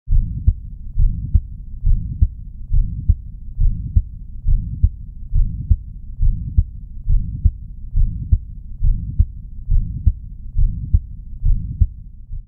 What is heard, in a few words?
vsd, cardiac; pediatric; pediatric-ai-scribe